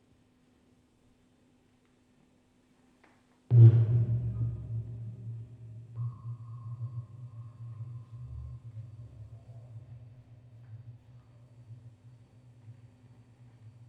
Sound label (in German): Aufnahme Rode NT4, Spieluhren-Quartett mit Tonband, Vocoder und Fieldrecording